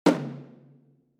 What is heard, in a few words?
Toms